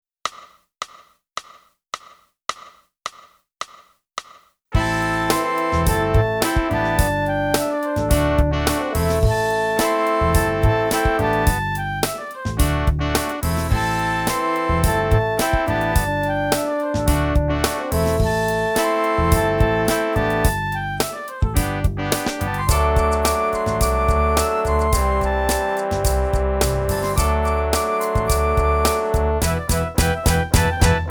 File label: clarinet; Boosey Woodwind Method: Clarinet (Book 2)